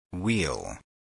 wheel

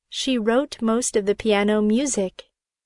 piano; She wrote most of the piano music.

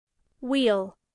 wheel

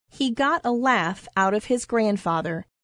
laugh; He got a laugh out of his grandfather.

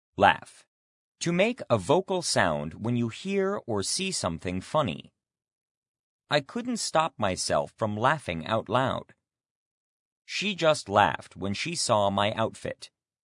laugh

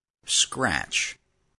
scratch

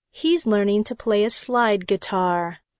guitar; He's learning to play a slide guitar.

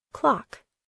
clock

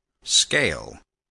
scale

